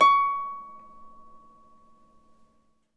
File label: Harp C#6; Miroslav Vitous - Percussion & Harp